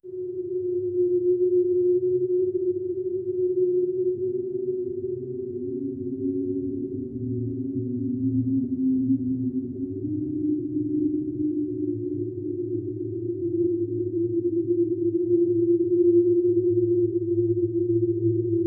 medieval swamp forest

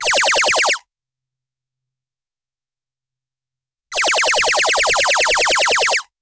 i want a pixel gun

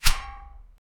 a dull airy woosh

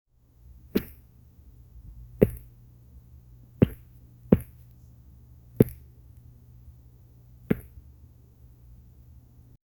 a soft satisfying pat or

